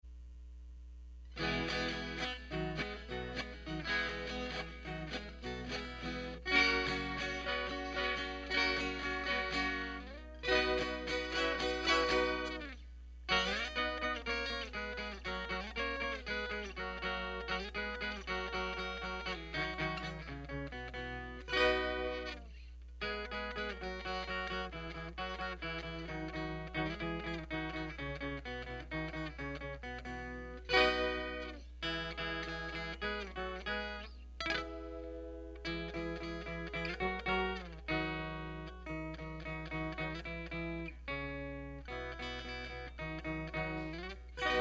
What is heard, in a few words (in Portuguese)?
viola istrumental